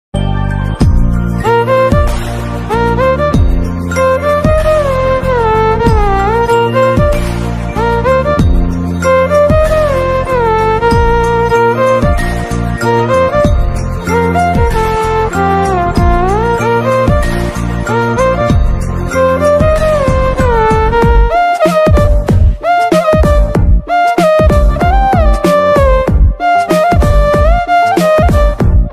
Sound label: telugu ringtones; sakhi